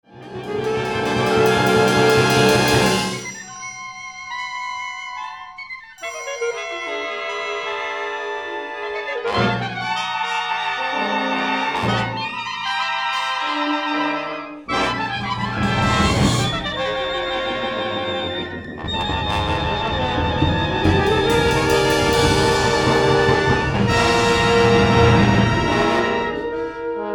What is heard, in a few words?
Wind Instruments